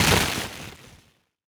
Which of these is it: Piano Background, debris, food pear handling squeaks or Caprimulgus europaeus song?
debris